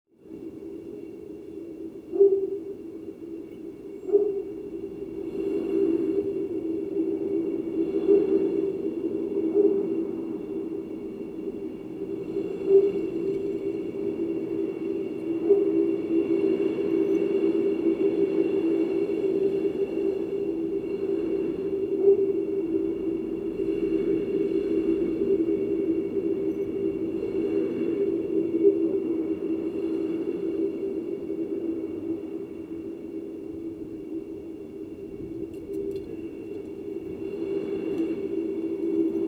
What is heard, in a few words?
two resonators